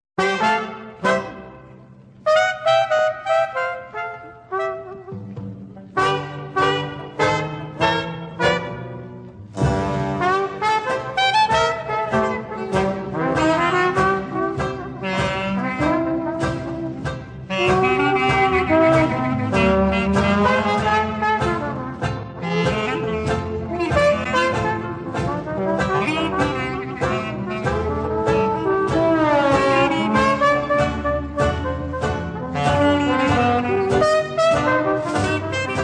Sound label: CHIMES BLUES